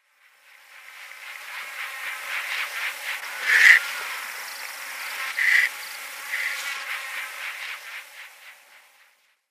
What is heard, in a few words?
Egyptian Vulture immature